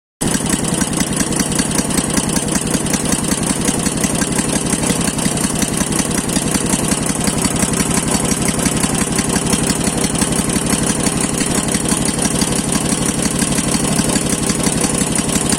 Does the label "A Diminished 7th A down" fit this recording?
No